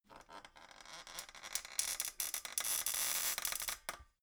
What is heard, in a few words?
folding chair creak